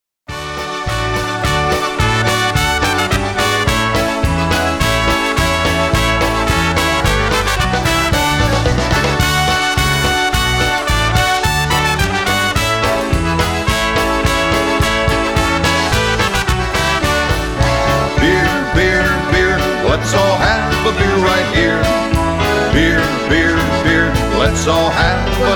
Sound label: Polka